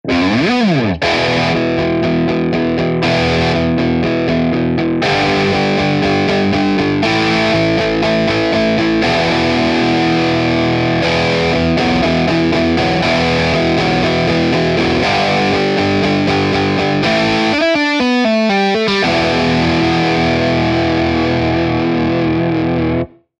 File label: strat crunch